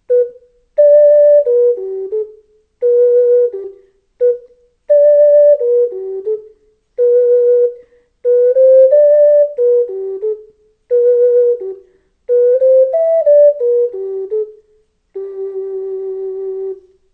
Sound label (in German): G Tenor Okarina